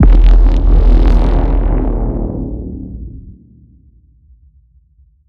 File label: Trailer Bass One Shot E